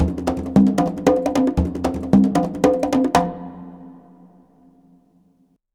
CONGA BEAT; Zero G - Ethnic; CONGA BEATS

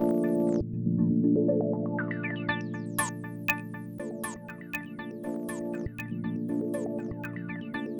VIBRATIO; Spectrasonics; Metamorphosis

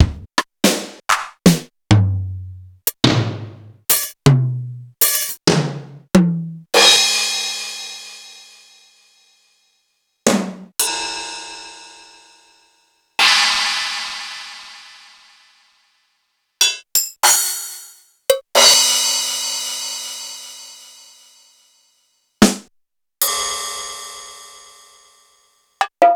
Roland U220 DrySet

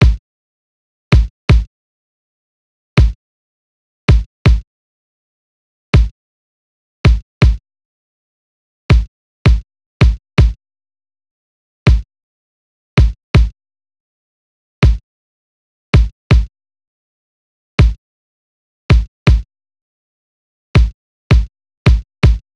kick A, Drum Tracks, 081 Cmin

